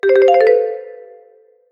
jingle

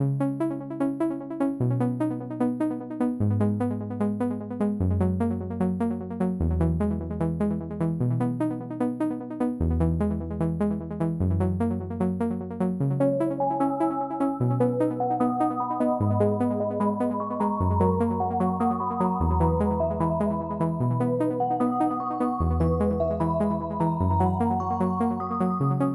Instrumental

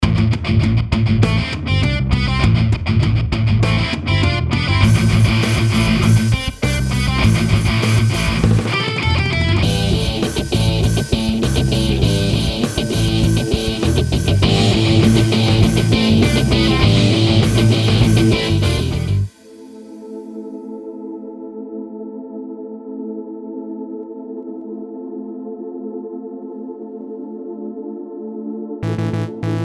metal song

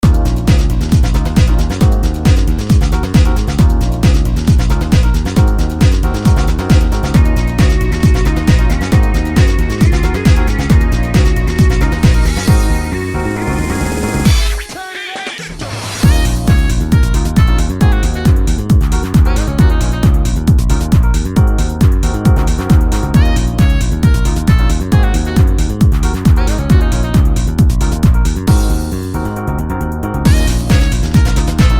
Jazz; Pony Music Archive

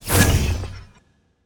Monster; Zenless Zone Zero

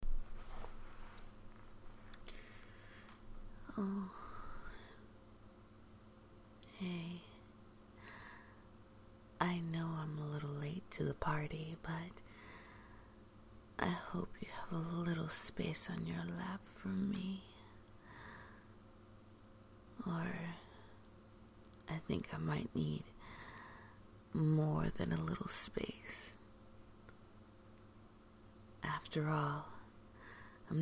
[f] Tigress-Sized purring

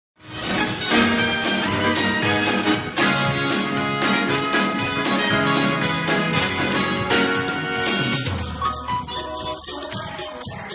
Instrumental Karaoke

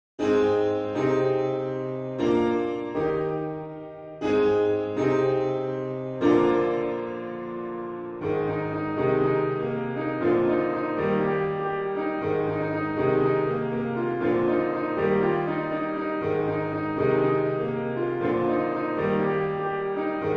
Piano Cover

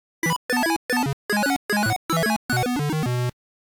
Chiptune Version; Bernd und das Rätsel um Unteraltbach

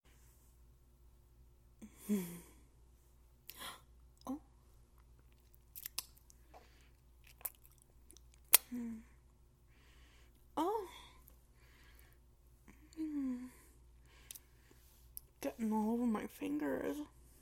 Moans, Whimpers, Licking, Sucking; F4A; One Daddy mention; Giggles; A sweet surprise

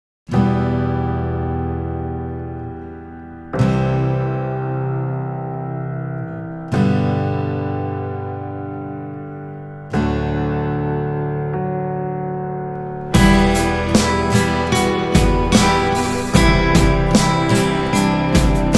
Acoustic Version; Dragonheart

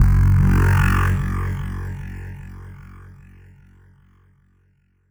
weird bass, 113BPM

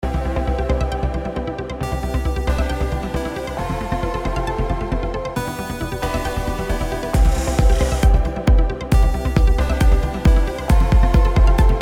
menu screen

